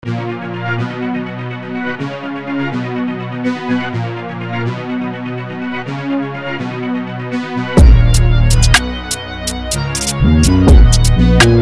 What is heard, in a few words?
beat